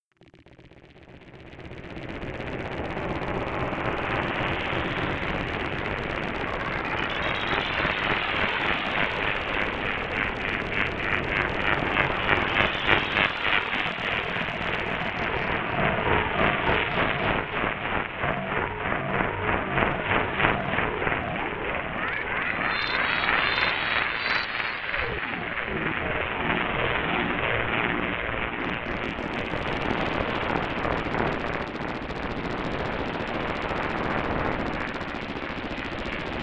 glitch filtered bbd

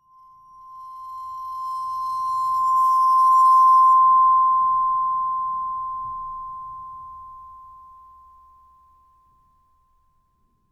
glockenspiel

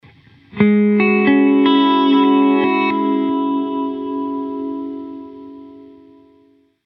Arpeggio

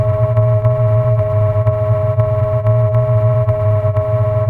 noise freeze table

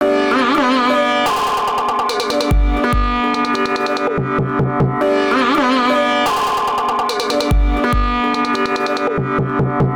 sitar; tidal

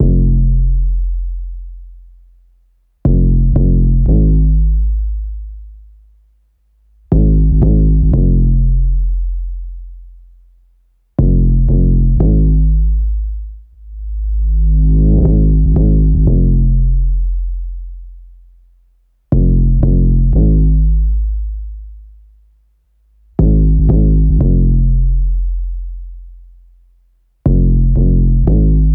Kick

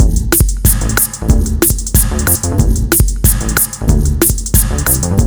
loop fast drum Bass

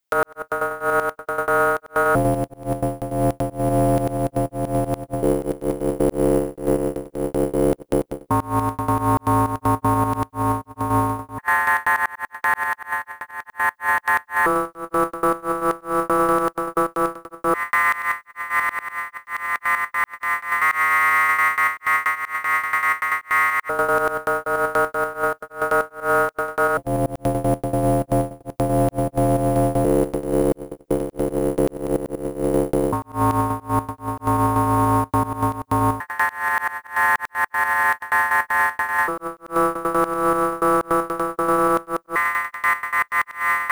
pad

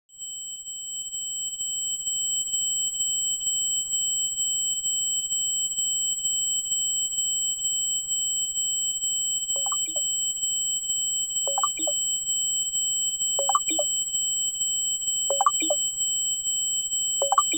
impro perfo

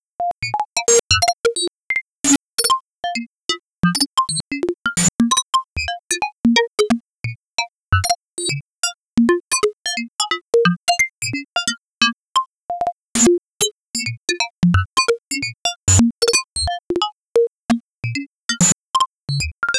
melody

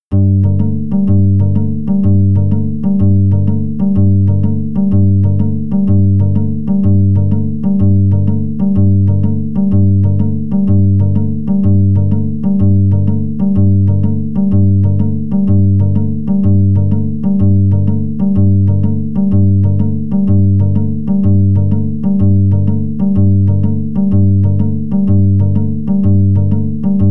acoustic bass line chords

